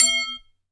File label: Aluminium Tube Drop